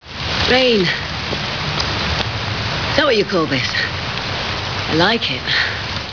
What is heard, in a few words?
rain; farscape; human